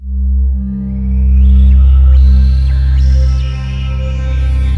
WS NL C 2-L, Korg Wavestation; Back In Time Records; Korg Universe VOL-1

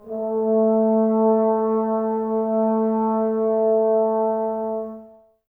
Brass, Woodwind Brass Ensemble; Miroslav Vitous - Woodwind Brass Ensemble; Tbs

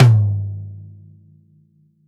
TOM, DRUMS ONE SHOTS; BASEMENT DRUMS VOL 2

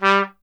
TP G; Miroslav Vitous; Solo Instruments VOL-1

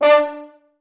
FH STAC D3; Best Service; Peter Siedlaczek's Advanced Orchestra

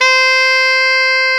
SAX XALTOS, Sax Sect.; Saxophones

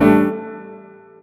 piano stab

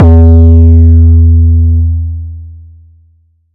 boom, Kicks; ModelCycles

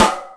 sfx perc

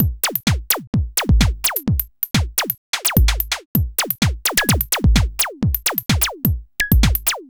Beat, Drums & Percussion; Electrocution; Beats

